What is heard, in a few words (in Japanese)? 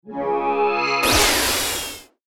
モンスター召喚から出現